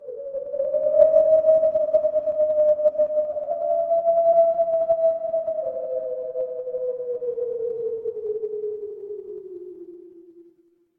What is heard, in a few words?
ambience wind